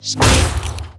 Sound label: Golem Attack